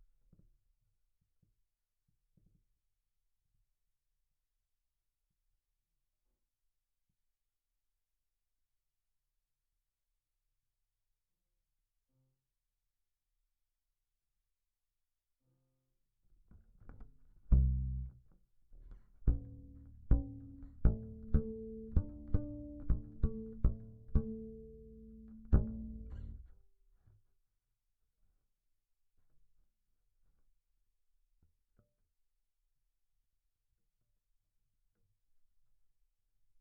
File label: Bass DI